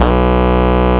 Root B, Ultimate Bass; Vengeance Samples

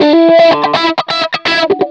125 a, guitar licks; PS-28 Vocal House Samples; instrumentloops

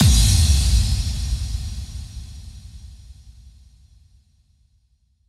bassdrum reverb FX kick; Vengeance Samples

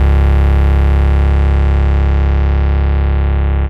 Bass Long C#; Vengeance Samples; Long Basses

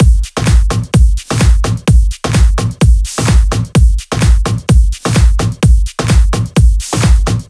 128BPM Brazil Kit; Vengeance Samples

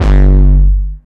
BD Distortion, Bassdrums; Vengeance Samples

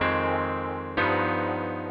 pianoloops 125; house; Vocal House; PS-28 Vocal House Samples; instrumentloops

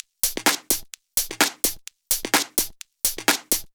Drumloops 128BPM

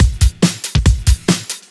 Breakbeat; Vengeance Samples; VEC2 Loops

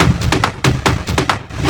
Loops Fills; Vengeance Samples

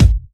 Hard Kick; Vengeance Samples

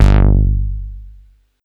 Bass Juno G; Vengeance Samples

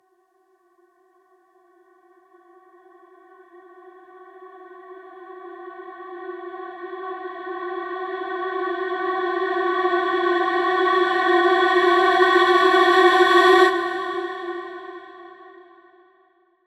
140BPM ReVox F#, Melody Fills; 8Bars; Vengeance Samples

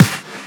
Snares & Claps; Vengeance Samples

